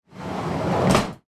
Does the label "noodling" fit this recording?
No